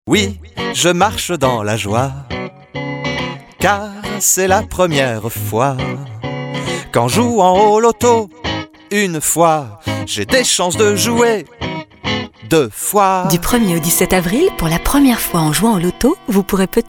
chanson; Loto

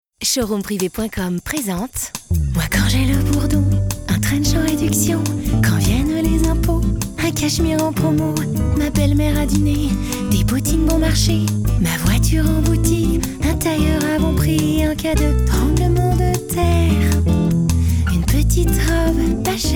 chanté; SHOWROOMPRIVE

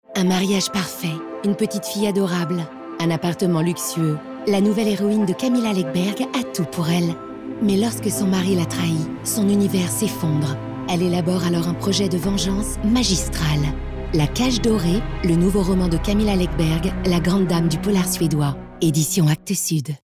Musique

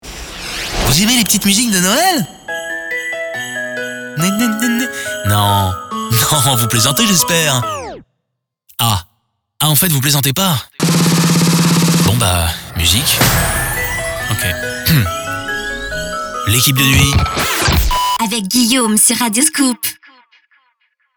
Petites musiques de Noel; LINER XMAS

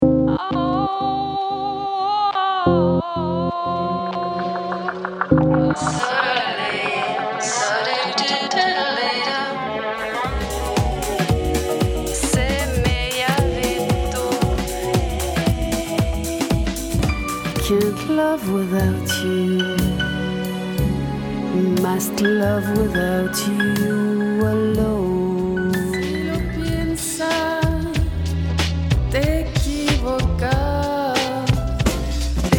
medley chanté

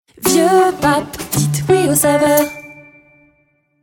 Jingle Chant; Vieux Pape

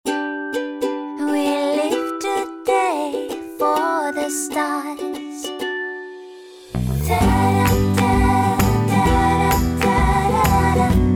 pop fredonné

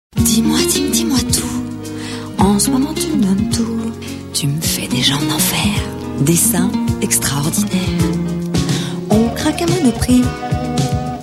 chanté

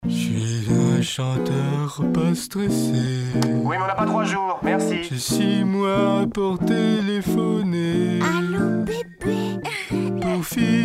Montage chanté

